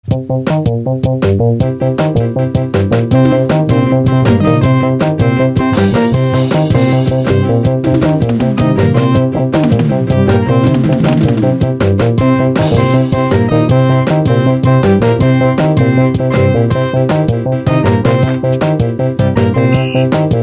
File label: yamaha pss 680